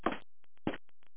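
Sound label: footsteps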